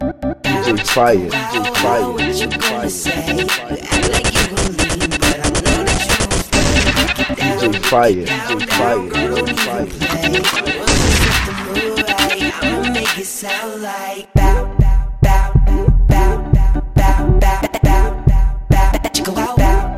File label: Remix